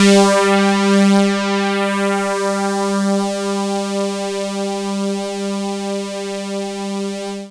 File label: PHAZE, Trance, SYNTH; AMG - Global Trance Mission VOL-2